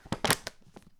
Âm thanh cuốn sách bị rơi

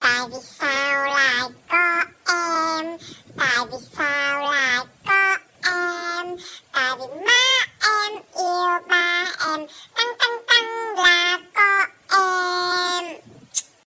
Nhạc nền tiktok

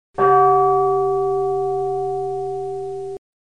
Mot tieng chuong chua

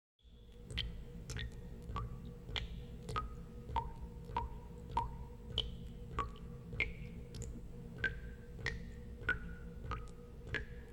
Tieng nuoc chay nho giot

